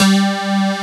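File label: Metal Bass; awe32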